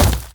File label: Spider Land; Mimic